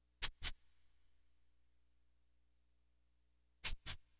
Egg Shaker; Sonic Foundry (Sony Creative Software); Continental Drift Aftershocks

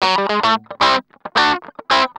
GUI, LICKS; 110 LICKS

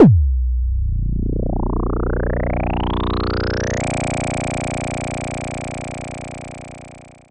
FX SWEEP, SYNTHSWEEPS

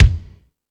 Fusion Stix, E-MU Pro-Cussion; drum machine

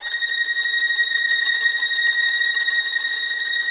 VI TREM F

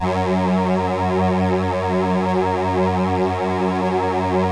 LAMBDA, String Machines

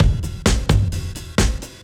Beat Style A 130, breaks; 130bpm

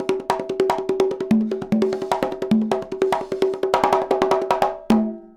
CONGA, Modern Percussion Loops

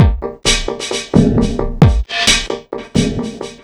Beats, Synchro Funk; Sonic Foundry (Sony Creative Software)

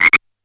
A#2 Frog, Yamaha PSR-E323 Sound Effect Kit

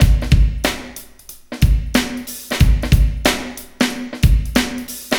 BOOM BOOM 92

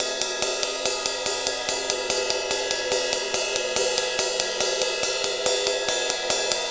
Ride Cymbal Pattern

